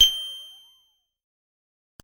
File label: Reload